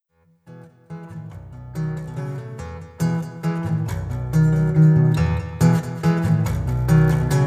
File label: guitar